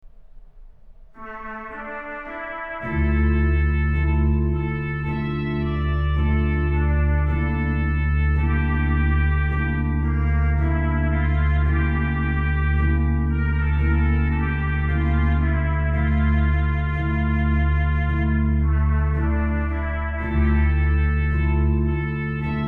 Ped Bourdon16 Sw-P Sw Stopped Diapason8 Solo Orches, pcorgan; Hereford; Psalm 130; Solo OrchestralOboe8